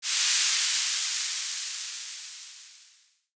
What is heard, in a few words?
sizzle sound